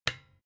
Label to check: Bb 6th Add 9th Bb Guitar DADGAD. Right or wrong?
Wrong